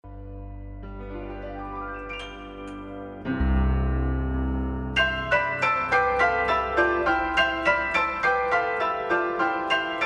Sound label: effects bells